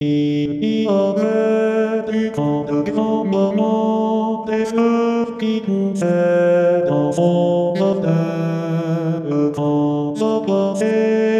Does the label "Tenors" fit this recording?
Yes